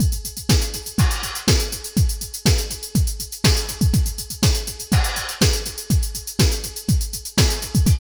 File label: LOOP, Tekno Industrial; East West